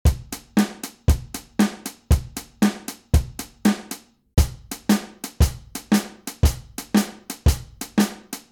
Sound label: straight and swung eighths